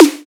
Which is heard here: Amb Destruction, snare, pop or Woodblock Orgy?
snare